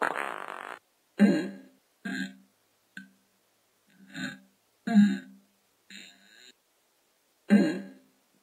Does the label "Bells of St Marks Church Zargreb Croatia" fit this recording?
No